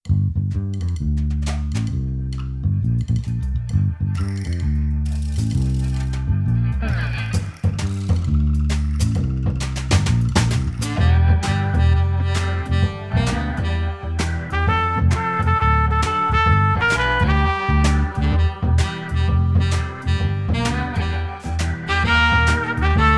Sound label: Dream Song Only Instrumental